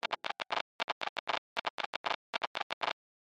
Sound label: vinyl scratch; soundscrate